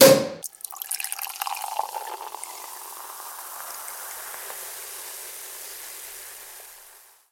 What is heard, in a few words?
Pop Pour